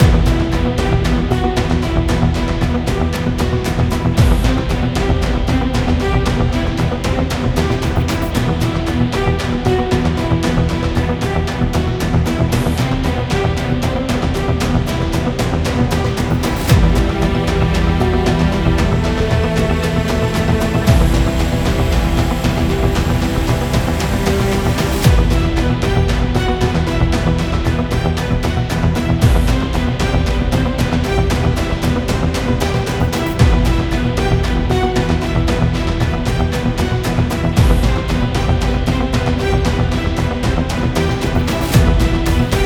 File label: tension guitar comp; gerilim; By Burak Sound Bank